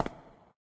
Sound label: cave grounds step; Golden Crayon Sounds